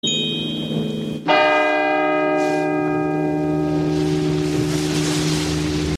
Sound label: chimes crystal bell toll